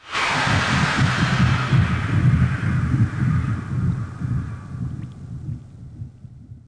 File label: fissure bubbles